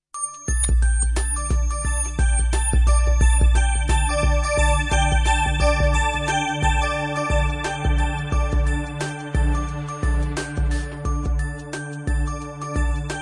Message for you ringtone music for phone and messenger